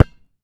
Street Ball Bounce Dirt, Street Basketball

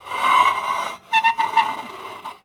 squeal; best service Blue Box; mixed sounds short

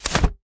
Umbrella Open; Miscellaneous; 1001 Sound Effects

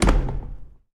door wood small closed; DeadFright

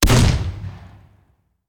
Hand Gun; Sound Ideas; Guns

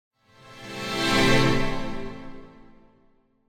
Symphonic Hit Short Strings Warning Calls; Hollywood Edge; sound designer's toolkit v2

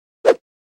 whoosh swish; NCH Library

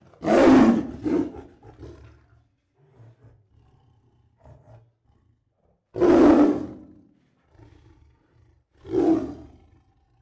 Aggressive and Angry Roars, Tigers; Sound Ideas

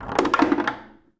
Foosball Goal Score

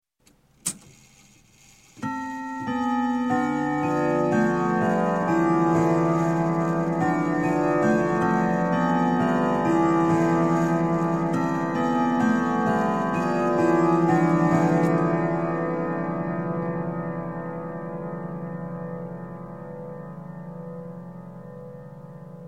Clock, Grandfather Whittington Chime - Quarter; Sound Ideas 2000 Series

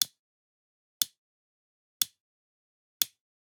Generic Click Plastic Tiny

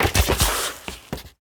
Bodyfall Concrete; SFX Kit; Bodyfalls

